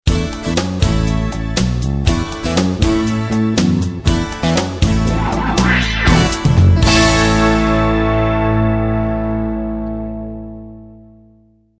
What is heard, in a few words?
New Jingle